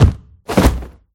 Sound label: stunned fall; kidnap; scripted sequences; eli